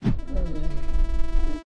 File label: left slowdown really hard; Pitch Black - A Detective's Demise